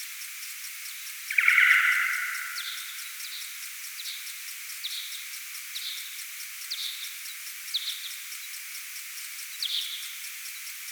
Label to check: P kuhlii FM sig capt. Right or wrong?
Right